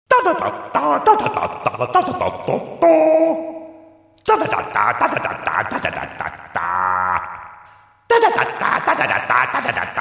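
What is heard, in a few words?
standartnaa melodia nokia, standard nokia melody; v ispolnenii mistera bina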